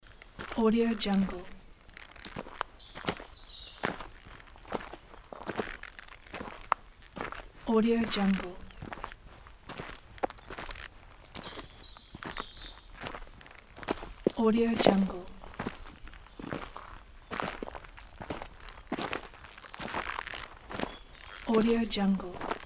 footsteps on forest dirt

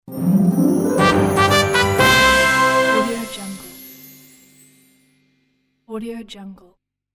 winning

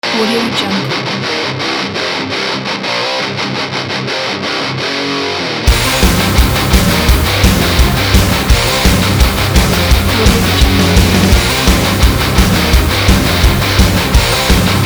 heavy metal power

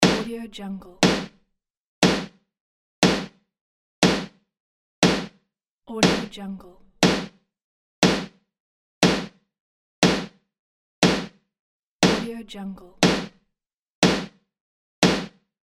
countdown

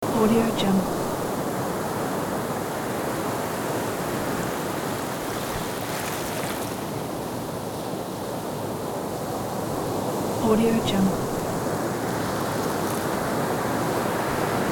seaside ocean waves close loop pack

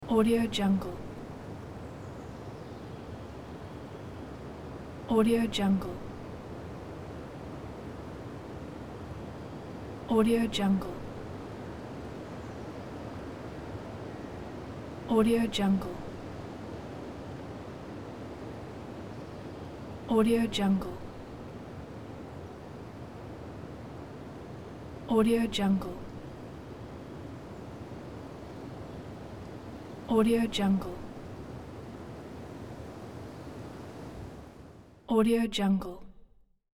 wind forest fierce

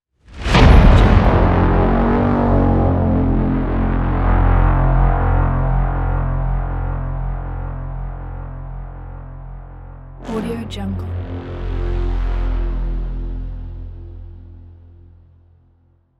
cinematic braam

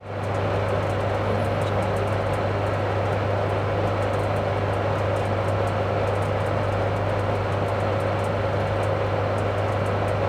generator

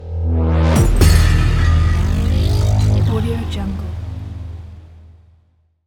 hybrid trailer rise and hit; brute force

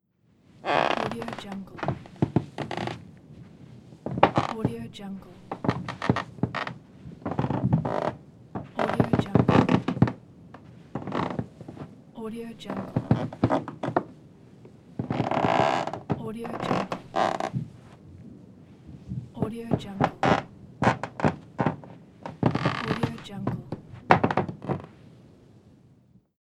wood creaks

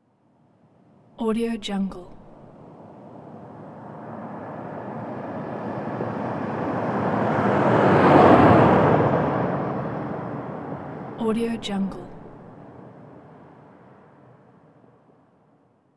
compact car speed limit pass by

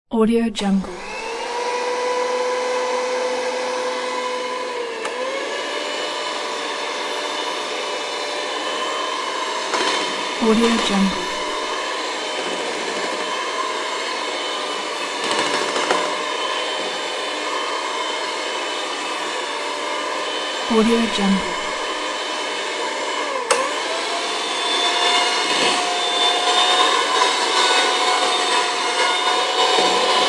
mixer

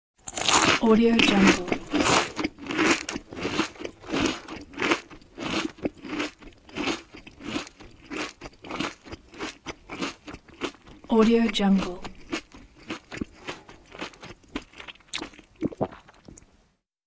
eating

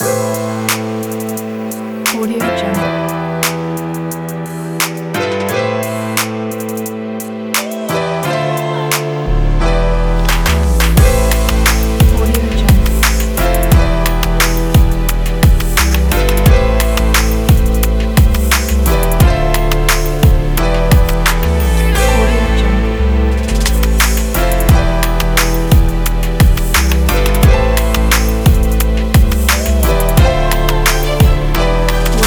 rnb

